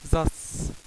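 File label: snowy owl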